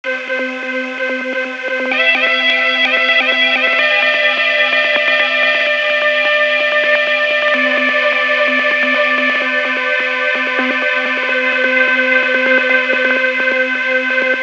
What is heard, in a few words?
INSTRUMENT